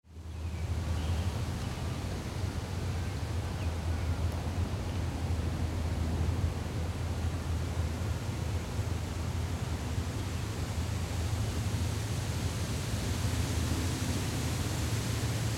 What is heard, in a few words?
nature birds